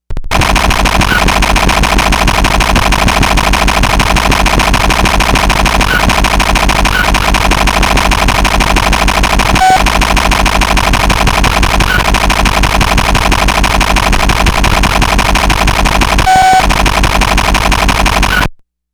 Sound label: Knock